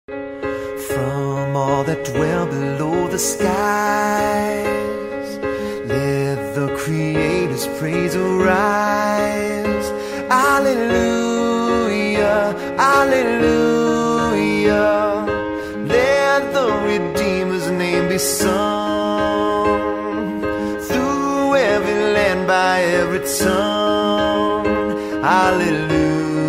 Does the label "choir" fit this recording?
Yes